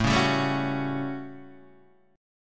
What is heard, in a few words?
A 13th A down Guitar Half Step Down